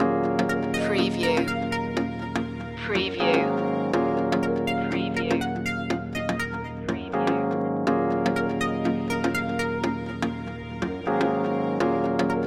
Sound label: soul club loop